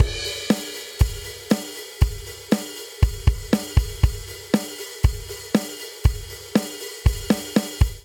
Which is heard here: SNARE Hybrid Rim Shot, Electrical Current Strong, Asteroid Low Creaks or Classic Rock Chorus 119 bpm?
Classic Rock Chorus 119 bpm